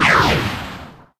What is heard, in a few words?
magic missile miss; deliantra